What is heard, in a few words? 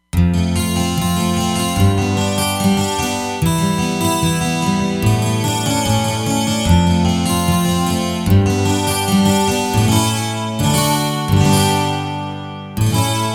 12str Gtr